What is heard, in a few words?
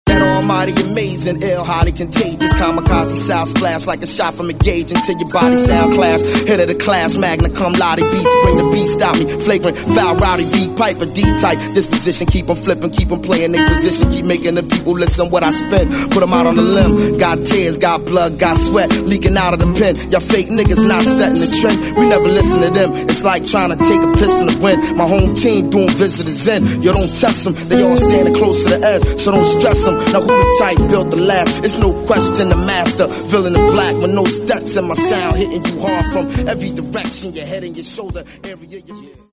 minus with roots acapella